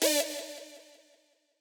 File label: stab